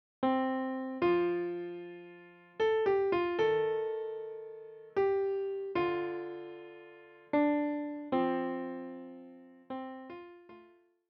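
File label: piano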